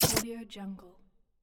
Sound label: game mechanical controls sound